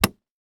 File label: Horror Impact Body Hit With Aluminum Baseball Bat